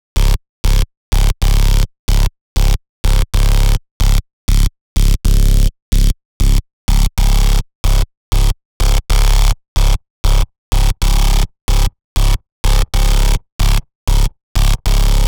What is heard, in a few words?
Bass